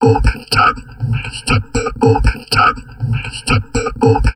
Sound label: TALK 120, Drum Loops Crazy Processed